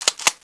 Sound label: 12 GUAGE, GUNS